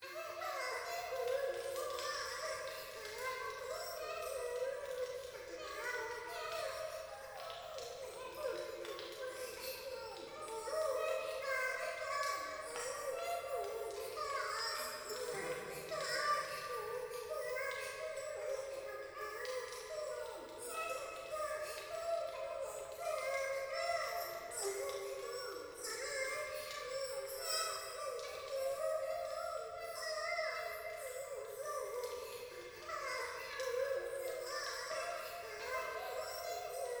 風呂 可愛い喘ぎ 暖炉

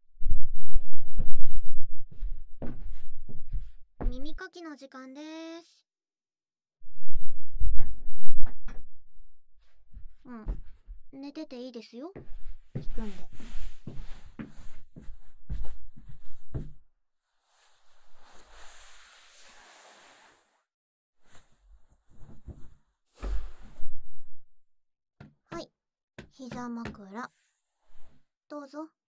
ブラシの耳かき, ASMR

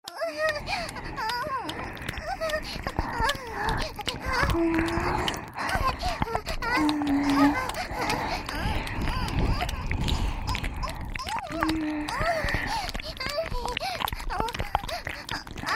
舌先 れろれろ 両耳舐め ＋ 可愛い 喘ぎ声; 3WAYループ; オナサポ

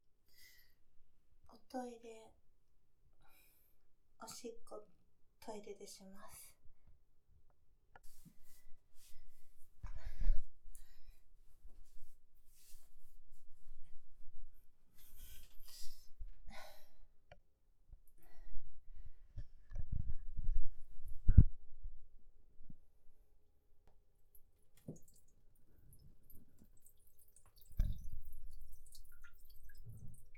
おトイレで! オシッコですッ!

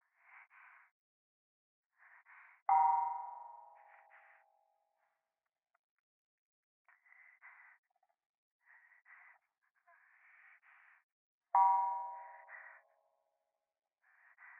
左耳 密着 吐息 寺

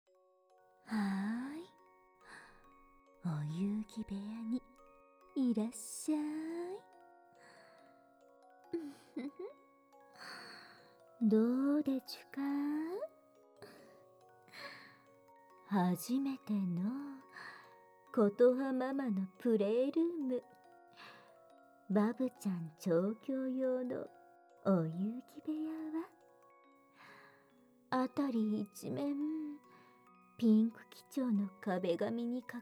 深呼吸でリラックスさせながら、添い寝で吐息責め＆ウソ喘ぎオナホコキ; BGM有り(推奨)

